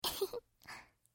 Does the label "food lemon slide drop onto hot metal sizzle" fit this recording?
No